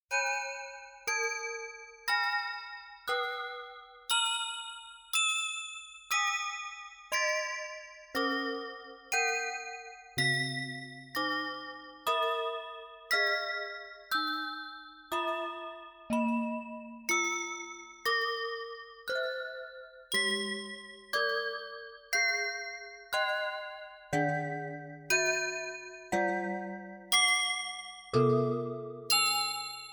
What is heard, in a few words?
Bells